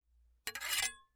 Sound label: Metal; TOOLKIT vol.2